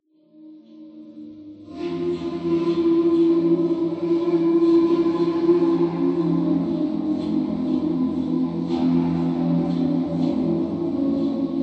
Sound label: Jazz